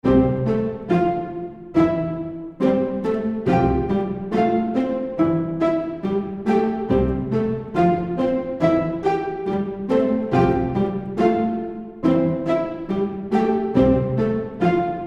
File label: medieval background; delosound